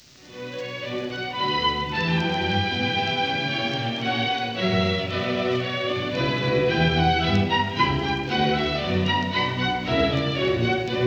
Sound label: Orchestral Suite No2 BWV1067; Bach; Mengelberg